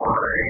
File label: alien; tuxpaint; magic